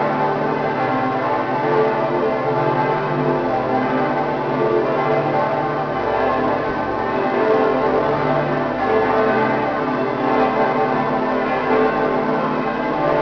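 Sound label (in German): Kirchen, Eichstätt, Dom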